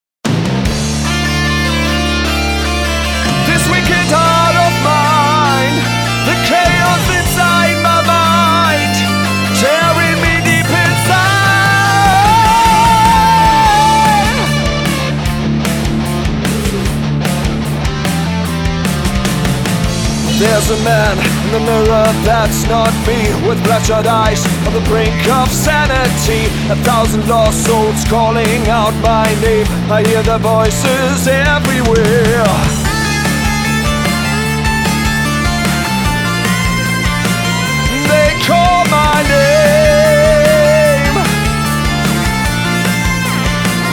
wicked heart vocal

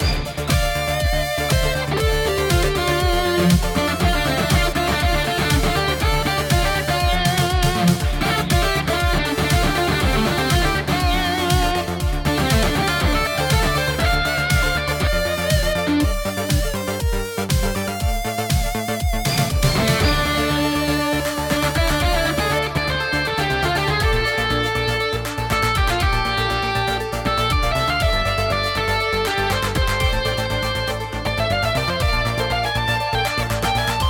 solo mockup

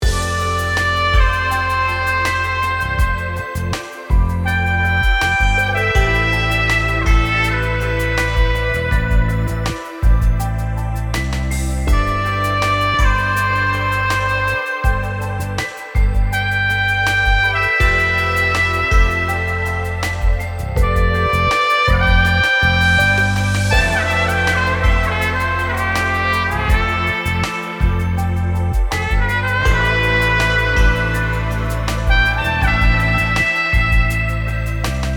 trumpet darker

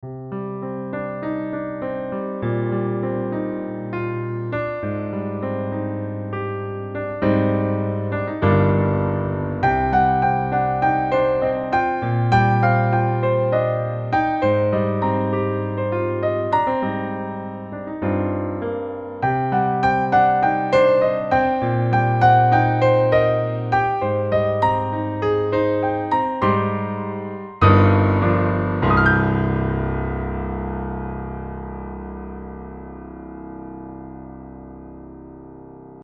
piano

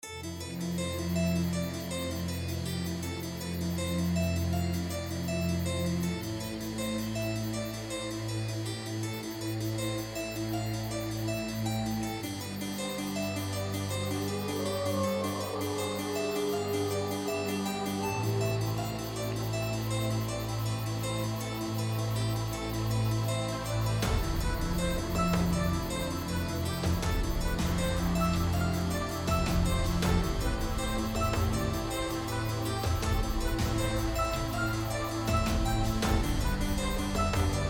cello, forest of monsters backingtrack; castlevania 4

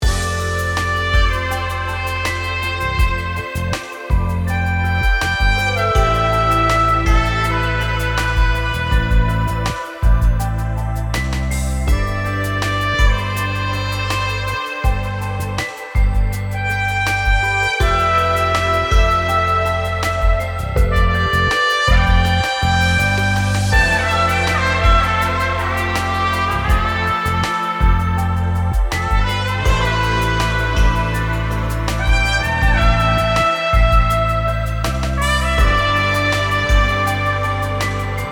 mantra trumpet bright

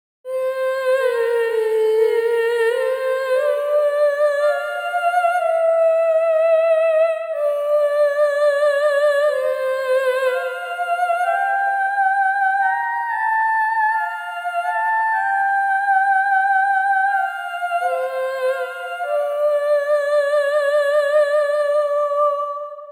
vox, 142bpm; terranigma

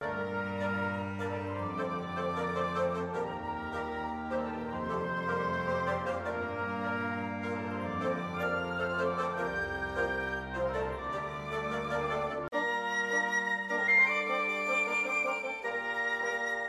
flute teaser

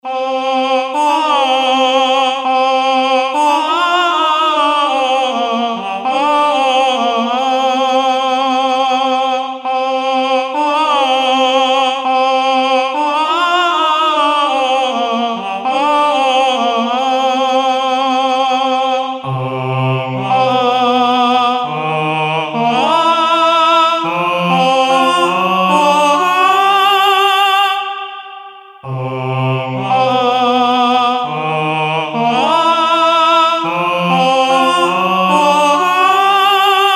tenor

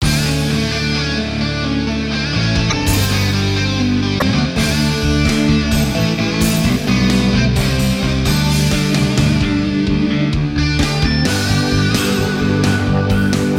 solo; usas

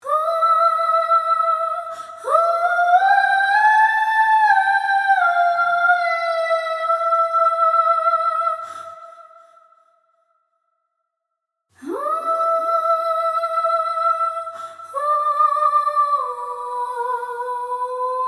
oh6 high, vox

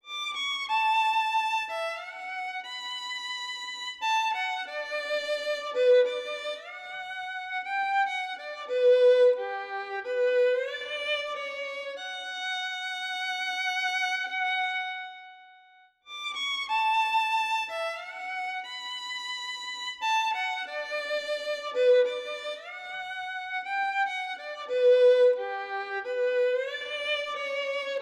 violin; suikoden

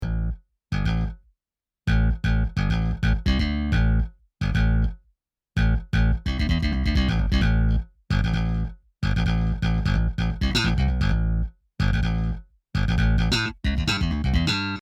bass

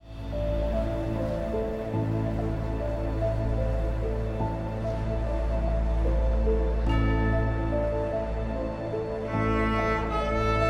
cello

